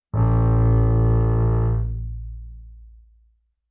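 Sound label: Bass arco fortissimo sul E Bb1, Double Bass; MIS Pitches - 2014; Strings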